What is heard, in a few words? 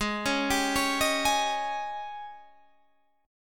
G sharp Major 7th Suspended 4th, Guitar, Half Step Down